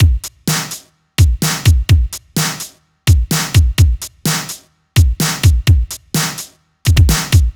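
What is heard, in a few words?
Retrofit Bass Drum Snare, 127bpm; Zero-G - Soundclash Nu Dance Electro; Construction Kits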